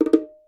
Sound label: battery countdown; Swapperoo; Android iOS mobile Switch Windows